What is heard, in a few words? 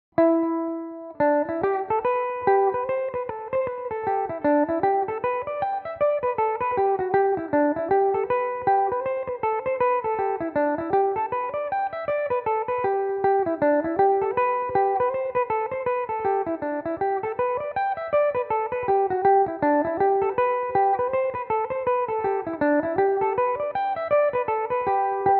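reel G major belmuse, irish mandolin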